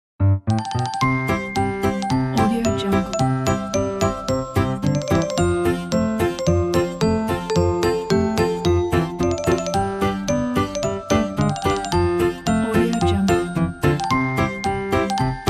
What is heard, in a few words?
cartoon comedy and animation